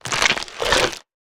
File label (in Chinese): 骆驼进食声